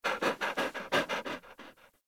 Puppy Idle Pant; Vocals; Warframe; Kubrow

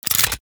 Pistol Reload; Thanotech

